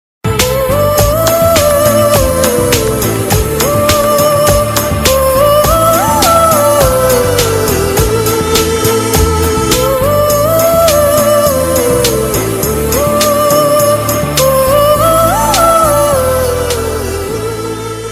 Humming

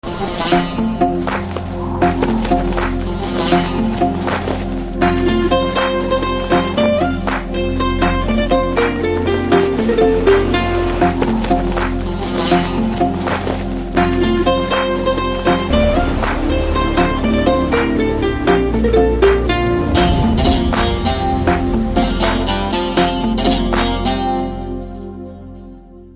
BGM Instrumental; Uppena First Wave

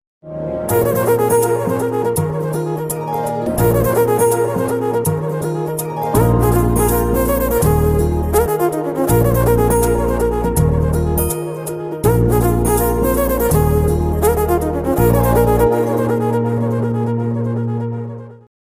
instrumental; majnu

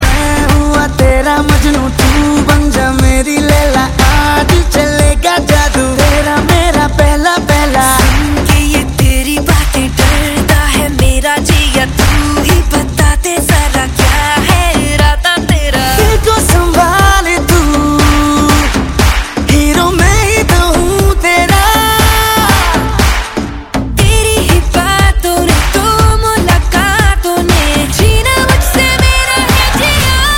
Song Ringtone; Street Dancer 3D

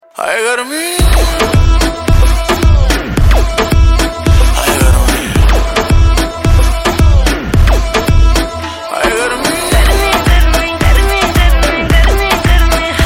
Song Ringtone; Street Dancer 3D

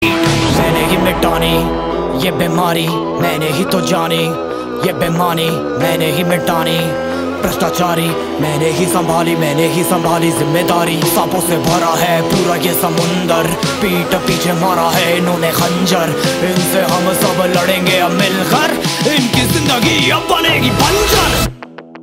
rap song

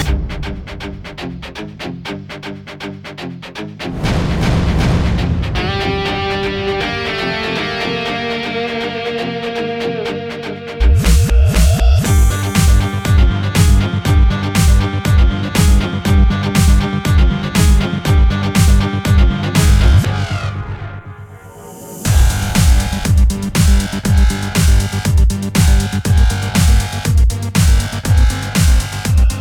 Overture Instrumental Tone; Dhoom 3

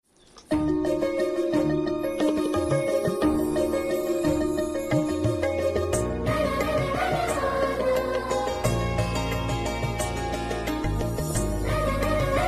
sad Instrumental bgm

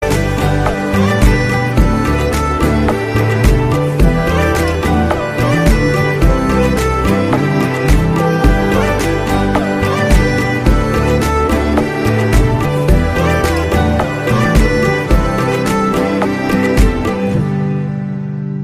instrumental

